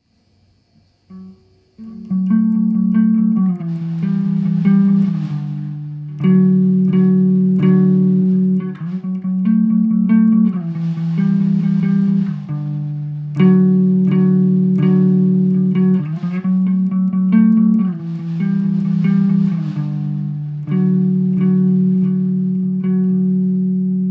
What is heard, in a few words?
the riff bridge thingy